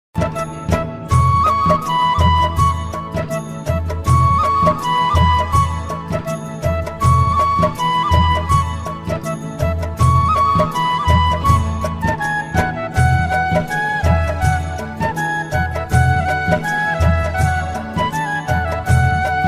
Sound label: bansuri ringtone flute ringtone